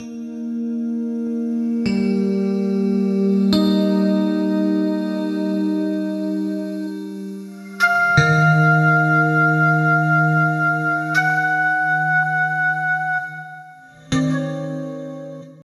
Flute piano sample; Musical Artifact Mod

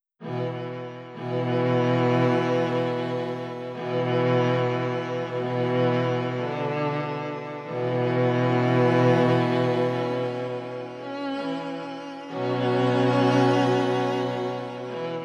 D 5 Strings Violin

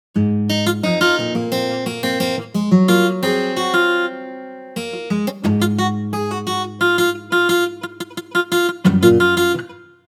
Ample Guitar

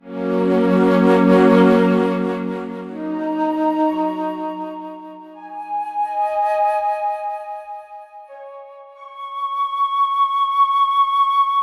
F 5 Flute Expressive; sso

